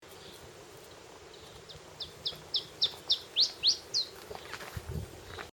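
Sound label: Iberian Chiff short 3 phase song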